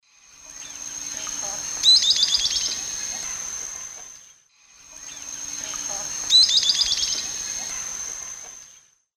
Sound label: Camptostoma obsoletum, call; S. America; Eastern Lowlands